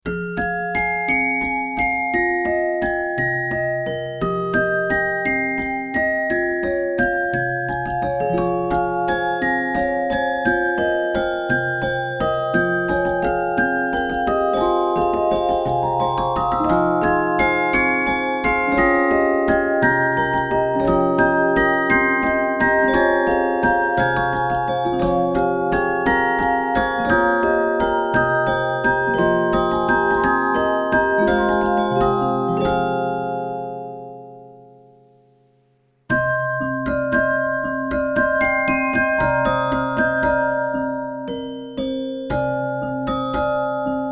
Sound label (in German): Spieldosen